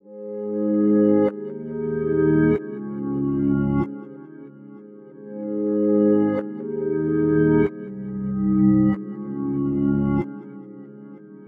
Keys Reverse G# 94; Melody; Keyboards

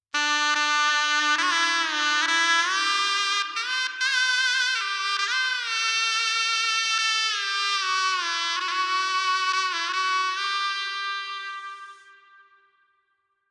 shenai 4-4 G 70 verb; Melody; Sample Banks

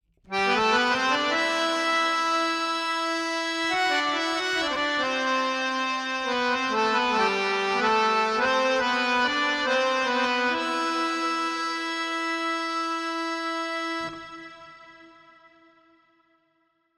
Fx, Harmonioum, 3-4, Em, 70; Melody

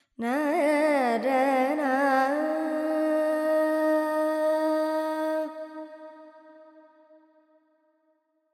FX Lydian, Vocals, Carnatic Female, Alaps; Sample Banks

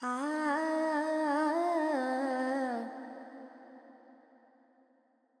Pentatonic, Vocals, Carnatic Female, Alaps, G

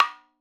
madalam notes R; Sample Banks; Percussion; MADHALAM

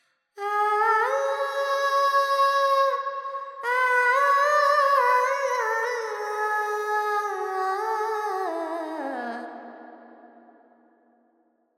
Verb Phrygian, Vocals, Carnatic Female, Alaps; Sample Banks

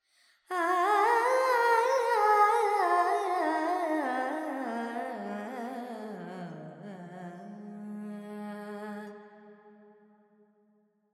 Pentatonic, Vocals Carnatic Female Alaps 70 G

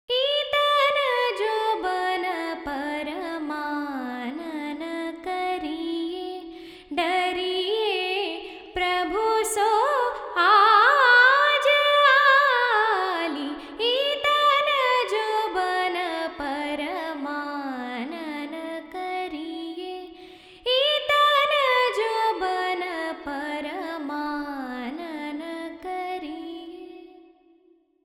Verb 4-4 D 70, Vocals Female; Sample Banks

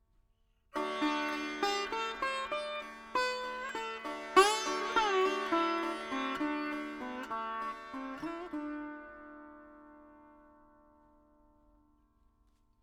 4 4 D 100 Dry, Melody Veena; Sample Banks

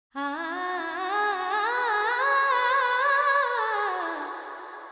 FX 4-4 D 100, Vocals Female; 3-4; Sample Banks

